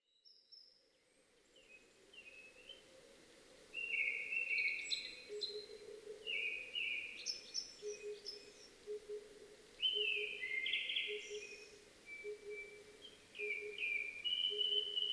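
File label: oriental cuckoo; tutudori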